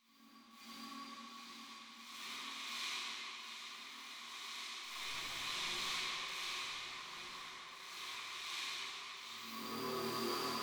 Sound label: Magnetic Field; 99Sounds; Magnetic Fields